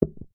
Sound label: household teaspoon drop carpet